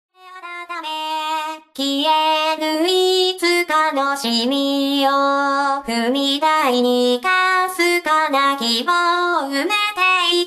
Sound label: vocal